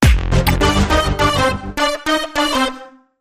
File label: Seven Synths Sting